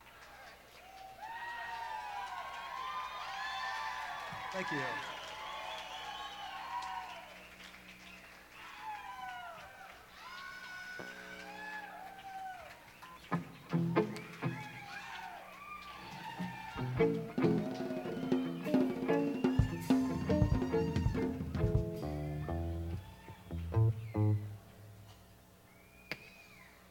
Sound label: Crowd; Madison, WI; Barrymore Theatre